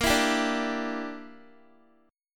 Bb 6th Flat 5th Bb, Guitar; Bb6b5 strummed; x,13,14,12,14,x

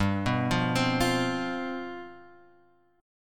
G 11th G, chord

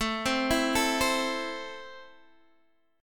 A Minor A down Guitar Open D

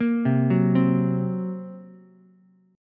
Gb Major Flat 5th, down, Ukulele; arpeggiated; JGuitar

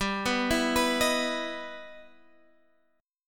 E Minor Major 7th G

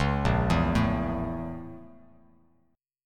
A sharp Minor Major 7th Flat 5th, Bass Standard; A#mM7b5 arpeggiated; JGuitar

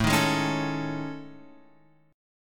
A Major 7th Flat 5th, Guitar, Open F; AM7b5 strummed; JGuitar

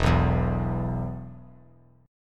E 5th; strummed; JGuitar

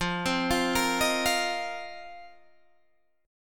F 7th Suspended 4th F down Guitar Standard; arpeggiated; JGuitar

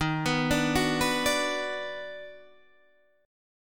D sharp Minor Major 7th Sharp 5th, Guitar Open D

